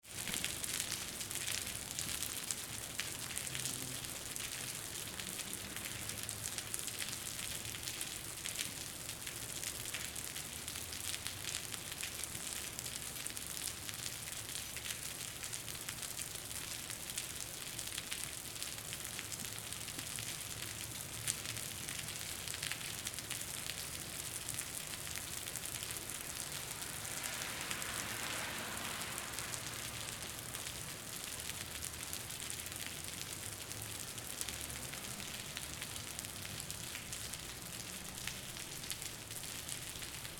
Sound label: Rain On Concrete In Alley Splattering Dripping; 2496SoundEffects; Rain in the City